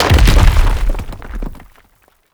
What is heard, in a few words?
rock impact big; skibidi critical dumpster; Skibidi OCTOPUS